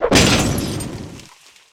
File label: throw potion; schattenlander